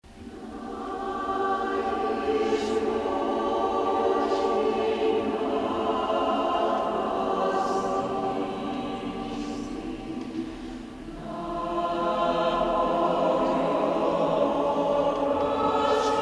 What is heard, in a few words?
chorus